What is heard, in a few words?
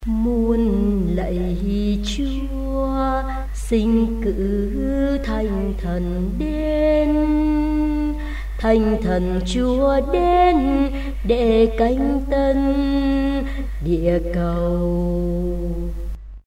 basso